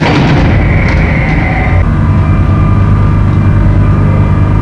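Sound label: elevator move; XDM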